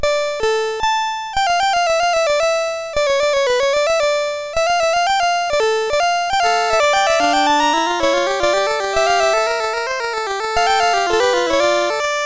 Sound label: Fugue XIII, MOS6581R2